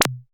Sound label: zap, Roland MC-909